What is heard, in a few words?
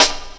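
snare drum, Drum & Bass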